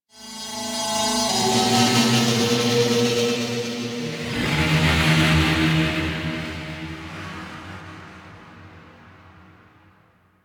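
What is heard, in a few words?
Tech Texture Medium, Ambience; Warframe; Cephalon Weave; DE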